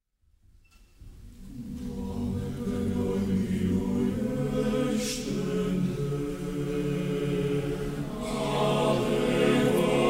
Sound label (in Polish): Ballada, Chór; Żiło 12 razbojnikow; autor nieznany; Bukareszt; Rumunia